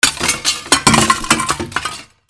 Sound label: FALLING